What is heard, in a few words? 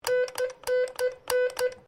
MORSE CODE SEMICOLON; Morse code aplphabete; Electronics